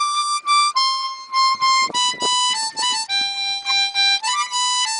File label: Harmonica